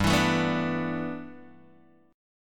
G 6th Add 9th, Guitar, Open G

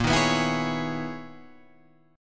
B Major 13th A sharp, guitar

